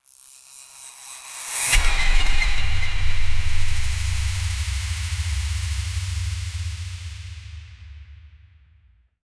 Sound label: mystical powerup